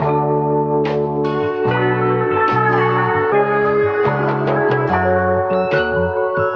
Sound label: horns stingers, phonky loops